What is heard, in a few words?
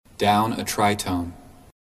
Tri Tone